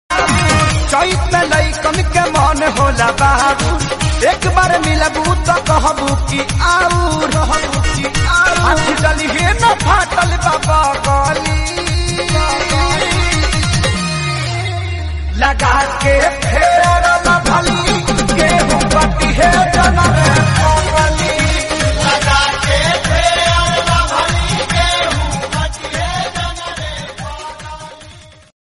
BHOJPURI RINGTONE

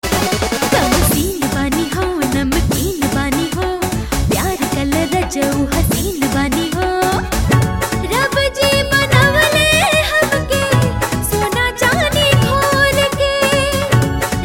BHOJPURI RINGTONE

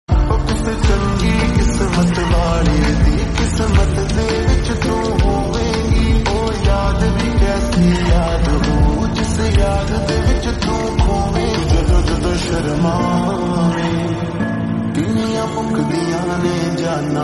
Lofi Ringtone

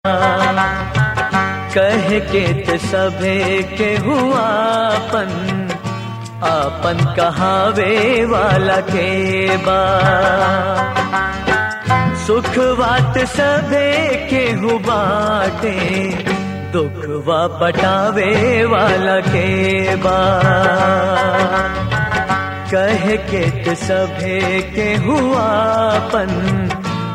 BHOJPURI RINGTONE

